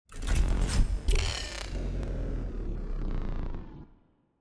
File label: power Down; Barotrauma; Real Sonar